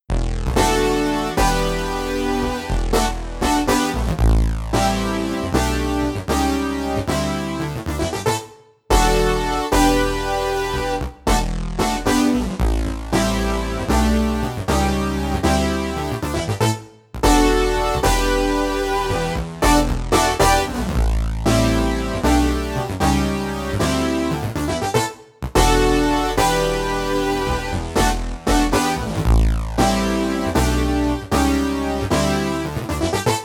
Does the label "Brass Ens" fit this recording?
Yes